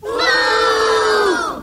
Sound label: boo, kids; ooh ahh